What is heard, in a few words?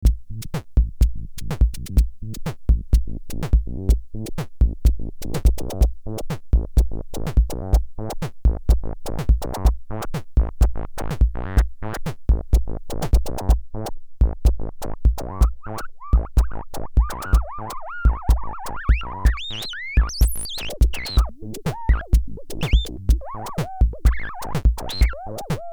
Rupert Neve Designs 5254 Mix Bus Fast Auto SC 130 Hz Parallel; 6 zu 1; GR 10 dB; Parallel 25 Prozent